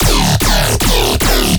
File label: Instrument Layer, bass